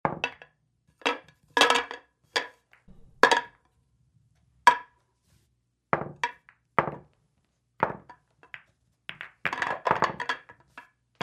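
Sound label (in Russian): выкладывают шары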